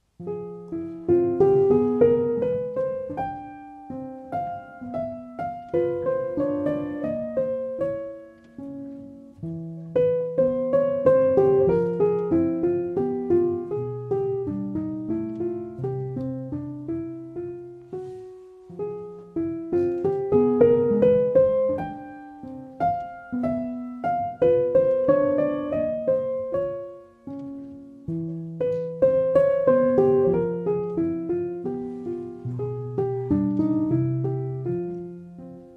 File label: Relaxing Music